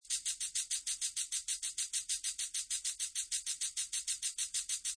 Hihat Synth2 Loop 98Bpm, Hihat Synth Loop At 98Bpm; Synth Loops